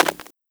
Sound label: snare noise; drums pads; noises